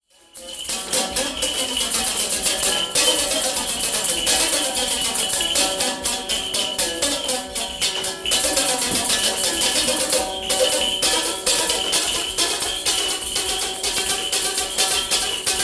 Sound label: berimbau